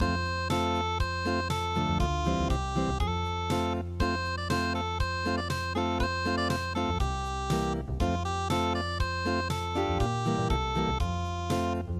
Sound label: moon unpluged